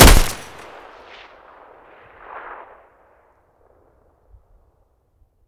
mg muzzle mono, weapons stationar

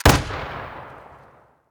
rgm40 muzzle mono distant, weapons

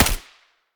sa58 silencer mono distant

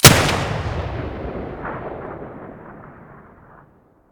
muzzle beneli stereo, weapons; m1014

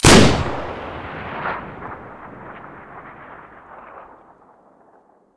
aa12 muzzle stereo, weapons; STALKER; IWP

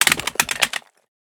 pistol drop tile; STALKER; IWP

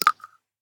shell 12ga dirt; STALKER; IWP

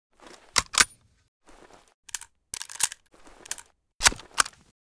mosin reload full reload, weapons

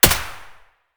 m1911 silencer mono distant; colt

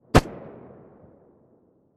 glock17 silencer mono distant

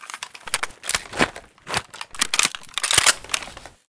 bizon reload empty

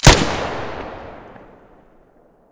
muzzle stereo, weapons

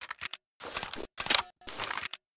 protecta misfire, weapons

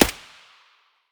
aug silencer distant; STALKER; IWP

